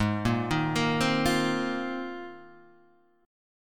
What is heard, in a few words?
Bb 9th Suspended 4th Ab, chord